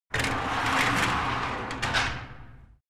The metal compartment door closes

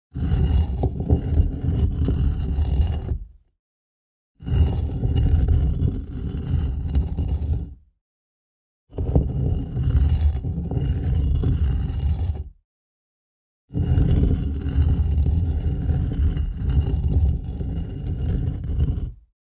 stone dragged on stone

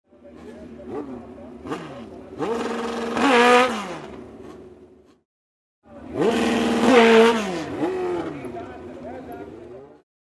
Ring motorcycle passing by; motorcycle racing

